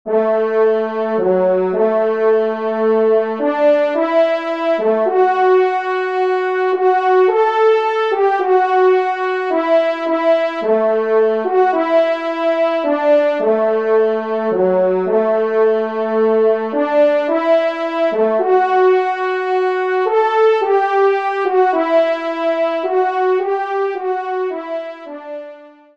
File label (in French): Valse, trompe; Loisirs du Chasseur; Pupitre 1° Cor; Henri Sénée